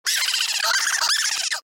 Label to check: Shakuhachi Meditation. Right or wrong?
Wrong